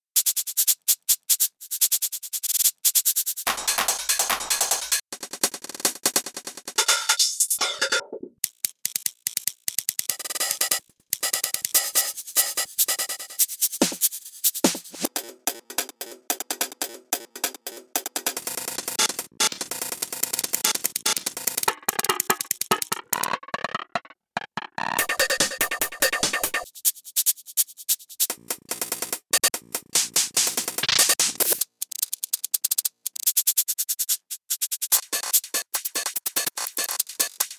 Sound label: Sliced loops